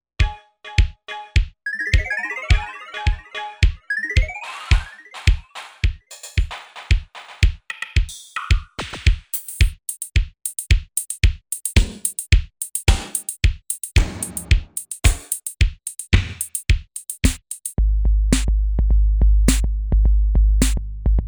Dance Kit

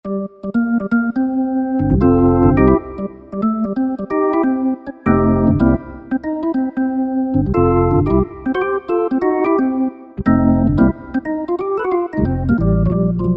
Organ, Yamaha PSR-3000; Factory Voices

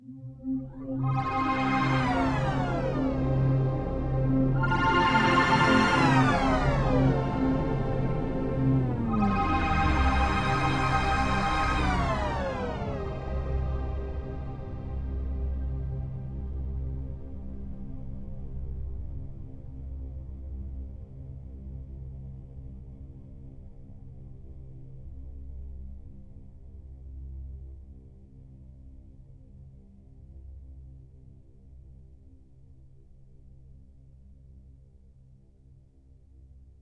Pitch Release, Yamaha TX16W; Spacey Keys

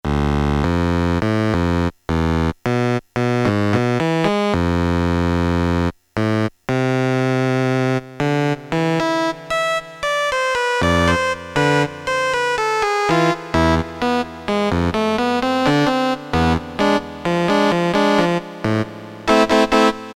cosmic tone, Casio MT-68; preset sounds

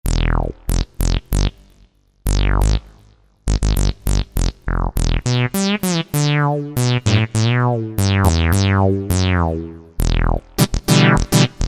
Funky Synth

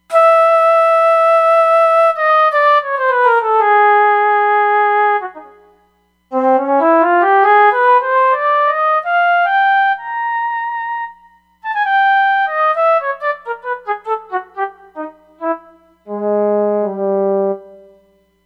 Flute

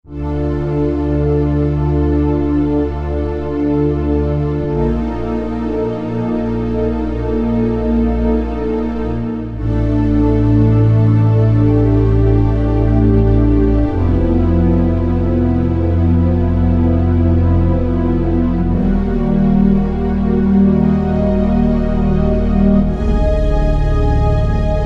Dark Analog Pad; Korg TR-Rack

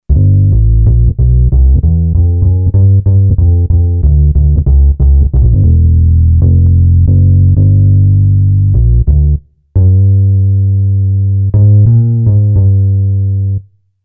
Acoustic Bass

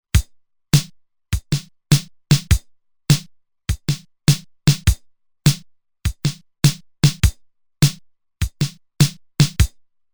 Fill In 4-4, Roland TR-505; Factory Rhythms

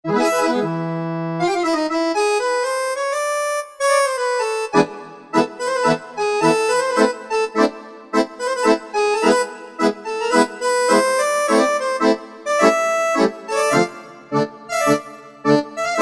Bandoneon, Korg MSC-9S Organ

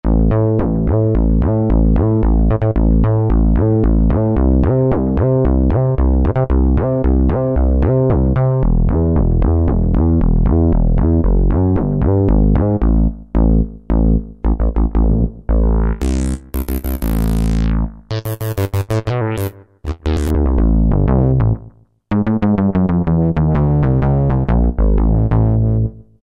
Wave Bass, Roland V-Synth; Factory Patches

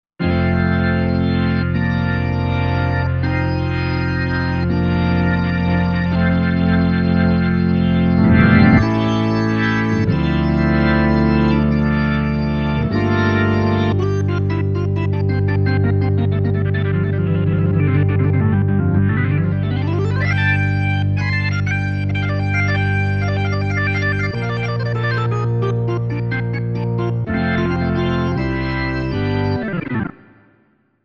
Big Drawbars

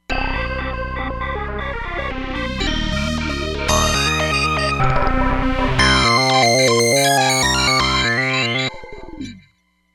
Step LFO Ens

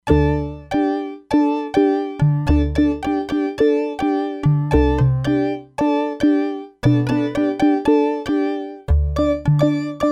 Deep Synth, E-mu Proteus-3; Factory patches

